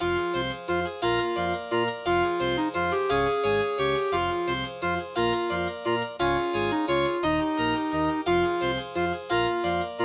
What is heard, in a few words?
Casio LD-80; Death Gate - Track 6